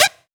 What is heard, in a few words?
Synth Shot G; Mutekki Media; Vengeance Electroshock Vol.2